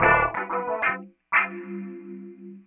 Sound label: Funk Strum; Sonic Foundry (Sony Creative Software); Synchro Funk